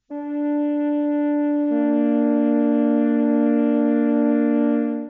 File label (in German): flöte d2 b1; Intervalle, die man durch Potenzen von 2 und 3 darstellen kann